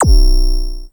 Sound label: Percussive Stab